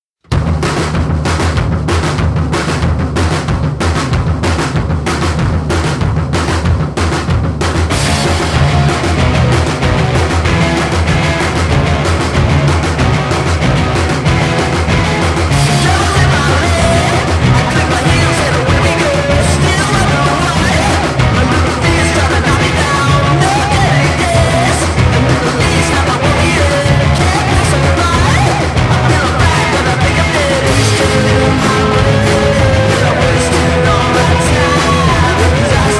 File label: bass drum